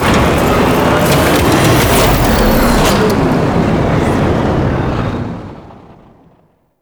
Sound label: Extraction Depart Full, Vehicles; Warframe; Liset; Liset Flight; Kahl Liset